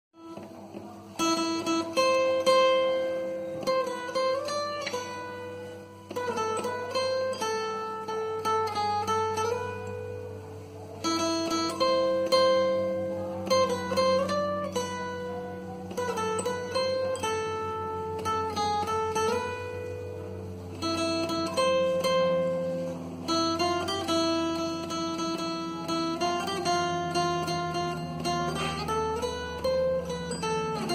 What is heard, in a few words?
Single String guitar